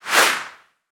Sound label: Lizard Attack